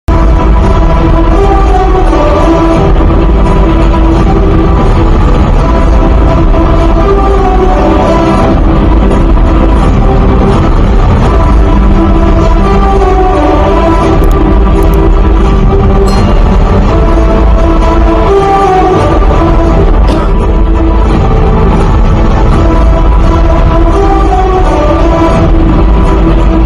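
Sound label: title background music ringtone; spy movie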